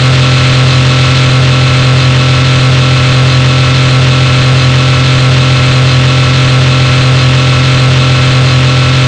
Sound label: engine